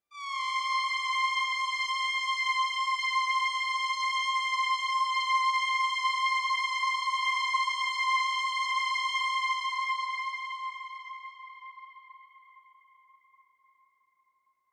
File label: Lead Synth; Martian Chess Audio